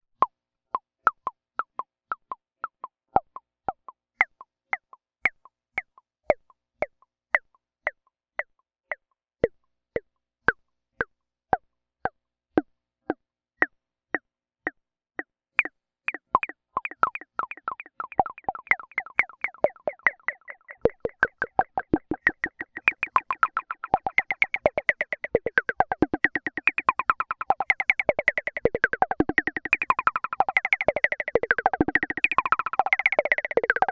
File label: sync delay; Digidelay